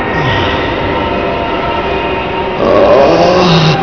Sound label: groan